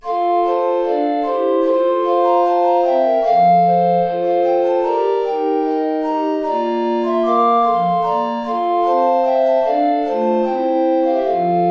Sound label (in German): Ballet (4 Blockflöten) (SATB); tiefe Notierung